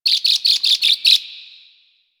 Bird Close; Ambience; One Offs; Birds; Warframe; Quests; The New War; Drifter Camp

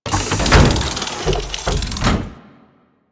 Spawn Unravel; Warframe; Corpus; Arachnoid Wraith

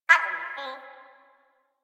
Flying Robot Voice

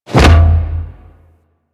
Pilot Breakout Hit Two; Warframe; Amalgam Carrus

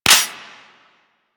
Cowgirl Pistol 2D Mech C; Tenno

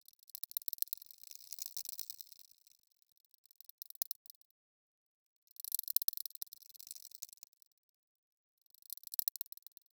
Corrupted Ode Critter Loop

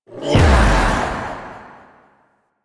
Execute Heavy Strike; Warframe; Warframes; Pax Duviricus

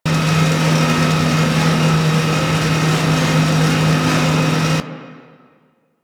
Ocean Metal Tube Hatch Loop; Ambience; Warframe; Grineer Ocean

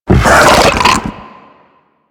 Infested Ancient Run Breathe, Enemies; Warframe

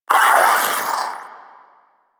Proto Infested Maggot Awake, Vocals; Warframe

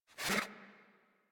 Pet Alert Snort; Enemies; Warframe; Catbrow; Pet Ship

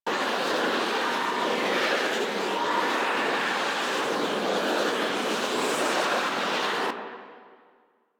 Tengu jump charge loop; Warframe

